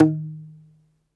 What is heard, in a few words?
DOMRA, Segundo Domra, Plucked Strings